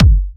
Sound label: Kicks; Vengeance Samples